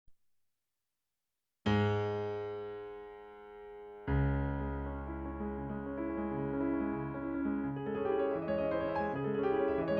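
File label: fantasy impromptu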